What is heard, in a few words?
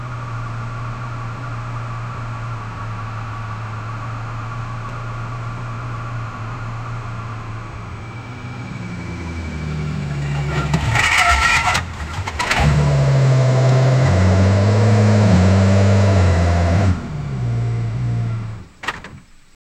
multiple sounds of a whin, petrol